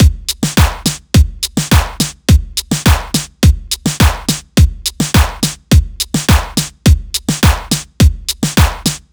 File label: Reggaeton Beat 105 bpm, drums; Loops; Ableton Live 12 Lite; Core Library